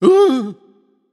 surprised; smite; voicepack; khepri

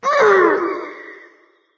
grunt lift light; smite; voicepack; hel

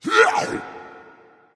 grunt; Smite; bakasura

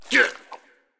hitreact small; minion s8; smite; npc; roman archer

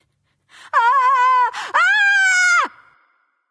fear; smite; voicepack; hera

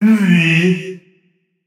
tongue, voicepack; smite; jorm

